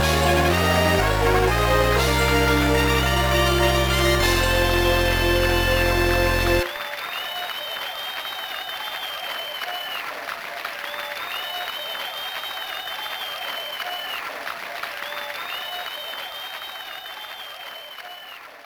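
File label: background music; No No No Puzzle Chailien; GBA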